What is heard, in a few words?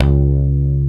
ANALOG COMBO; OMI - Universe of Sounds